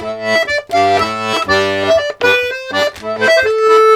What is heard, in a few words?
Accordions, 120 laride; USB Soundscan vol.40; AKAI